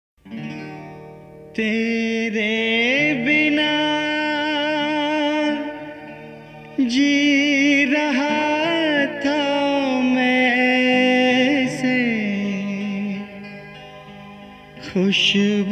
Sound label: Sad